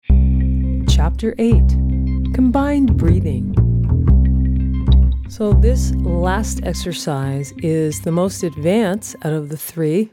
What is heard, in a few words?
Combined Breathing; Chapter 8 Step 3; core breathing exercises; Core Vocal Power